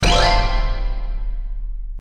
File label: bomb explosion; jewelpetsmatch; jewels